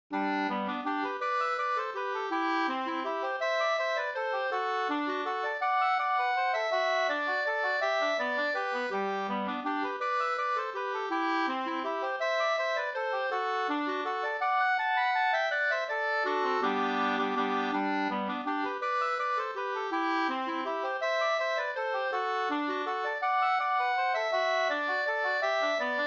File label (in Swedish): vals Brudvals; fr Skackan Vg e Ernst Wallberg